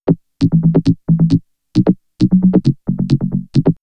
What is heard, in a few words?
Vocoder Loop; X-Static Goldmine; VocoderLoops